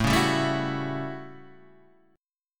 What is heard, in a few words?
A 13th, Guitar Standard, chord; strummed